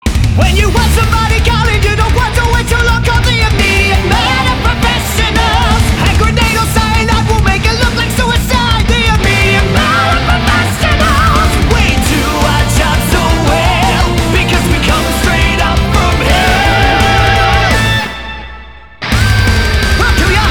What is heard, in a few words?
Jingle (Rock Version); Helluva Boss; Season One Soundtrack